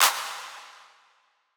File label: clap